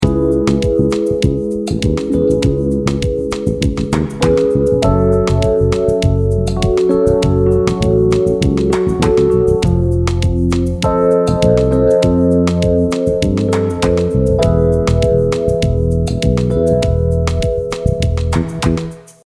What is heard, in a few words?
6221 E major, C#mi7–F#mi7–F7–Ema7; tritone sub for the V chord (so that B7 becomes F7); bass; chord substitutions